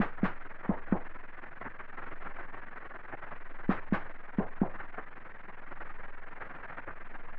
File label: landmark borehole; adarkroom